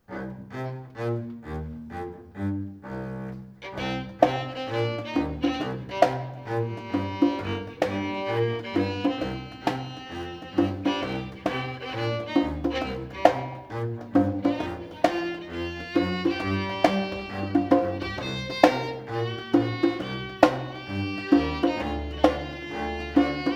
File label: combo jam